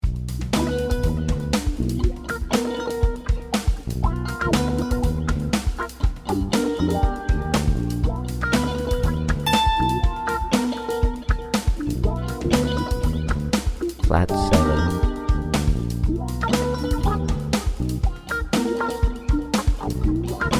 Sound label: B Lydian #2 #5; Harmonic Major; Direct Application Ear Training